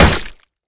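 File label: strong juicy hit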